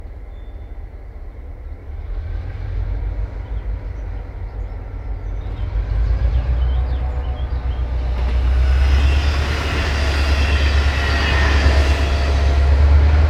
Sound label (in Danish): SRP MY 1148 & CFL 1831 Tomgang + igangsætning + hilsen med horn; MY og CFL tomgang og igangsætning (horn)